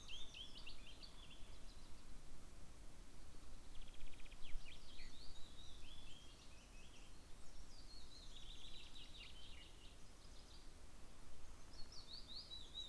forest ambience; nearforest; soundscapes

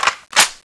clip in; tfa cso; kh2002

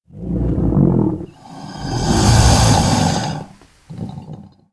worm idle growl, giant worm

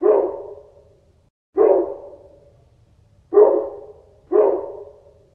distant, dog

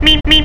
horn

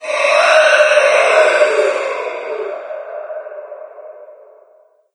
distant screams; doom3

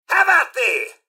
generic robot attack; mrhandy; npc

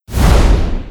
jump

